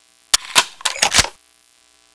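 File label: grenade launcher reload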